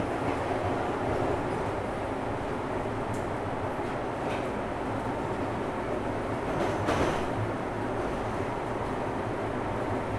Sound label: Subway Ambience; MSX2, Windows; Buster Jam demo